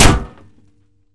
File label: Imp Bullet Light Ballistic Solid Metal